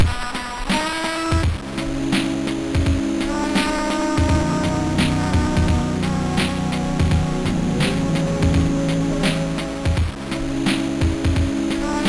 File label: Hip Hop, sid MOS6581R2